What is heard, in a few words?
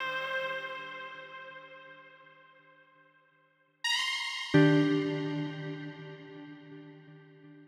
Gtr St Loop 125; Equipped Music